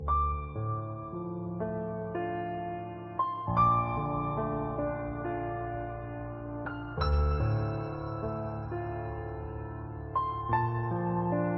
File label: piano faded, sad loop; Loops